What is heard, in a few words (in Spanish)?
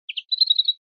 pirreos, verderon